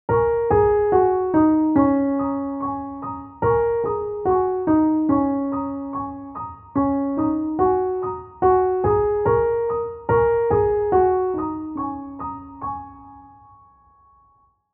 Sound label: Falling Falling Leaves piano only